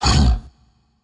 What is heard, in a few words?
win; DinoReels81WD; wazdan